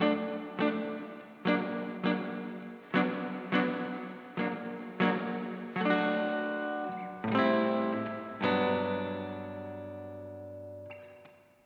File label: Soul Guitar, 70s Analog Funk; Sonic Foundry (Sony Creative Software); What it Is!